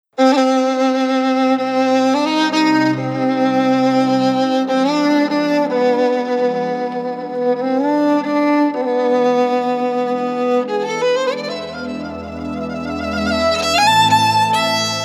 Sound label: Instrumental